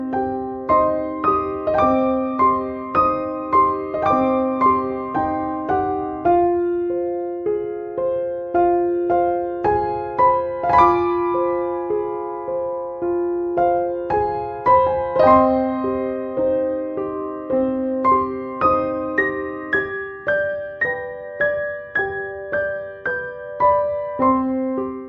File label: classical